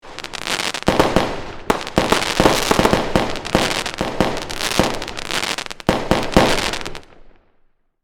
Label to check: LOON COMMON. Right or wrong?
Wrong